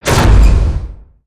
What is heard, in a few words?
Bridge arrive